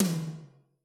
Toms, Yamaha RY20

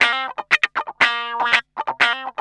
GUI 120 Licks

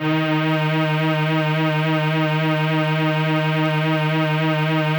SYN, ENSEMBLE

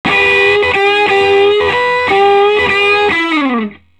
SOLO E, Vintage Blues Guitar; USB Soundscan vol.22

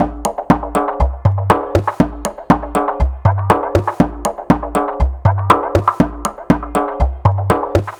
Conga Loop, Ethnic Instruments; Sonic Foundry (Sony Creative Software); The Electronic Music Manuscript Collection

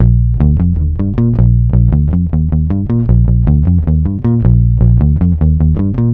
Flutes & Swingers Bass A#; Zero-G Kocktail Kollection; Construction Kitz (Lounge)

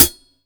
POWR HH, POWER DRUM; AKAI S6000 CD-ROM - Volume 1; DRUM RYTHM

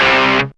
Dirt Guitar C4

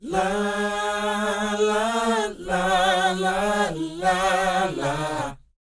NA-NA C#; Spectrasonics Vocal Planet

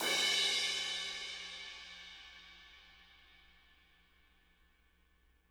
crash, crashes

